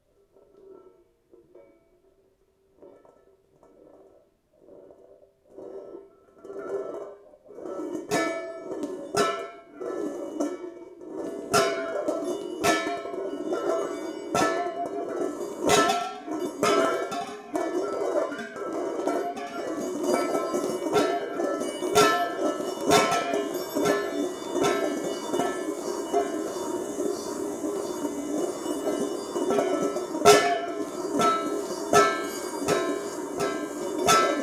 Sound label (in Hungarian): keringtetes, golyok femtalban keringtetese; Perkupa; hangkeltés